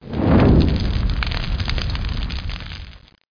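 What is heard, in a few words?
burn medium; TA Theme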